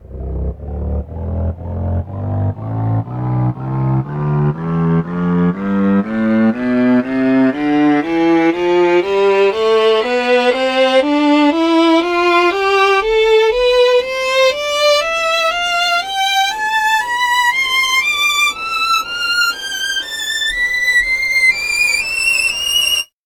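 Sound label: fiddle scale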